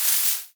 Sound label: brush on movements